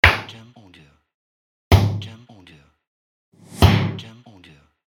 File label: Punch Hit